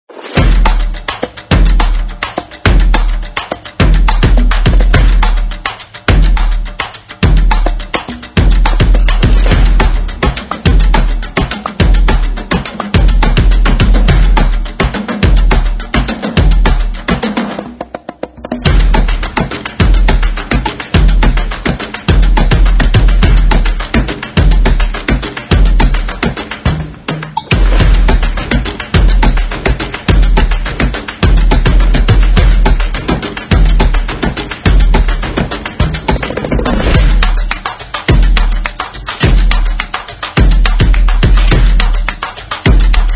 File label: Stomp